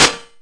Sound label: spark; GZDoom